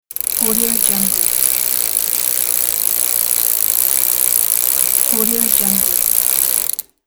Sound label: bicycle backpedaling clicking medium